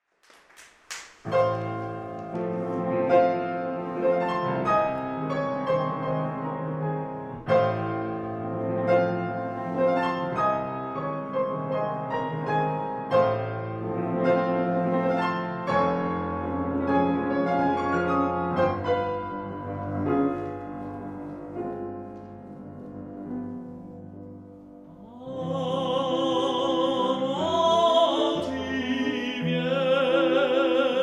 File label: Amor ti vieta, Tenor; Giordano - La Fedora; Meisterkurs